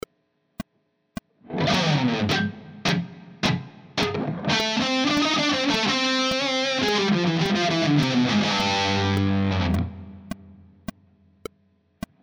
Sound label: harmonic major